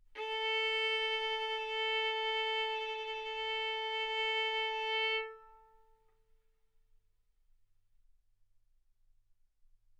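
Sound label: Violin arco mf A string A4 B4 stereo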